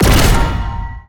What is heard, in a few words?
shotgun double